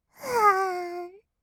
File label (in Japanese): うわーん, kawaii voice; 1200 kawaii voice!!; 色々なワンショット